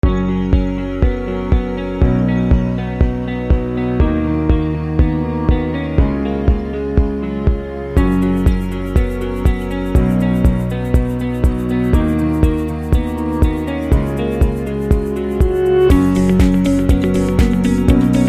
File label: Corporate Inspiration; corporate music vol 4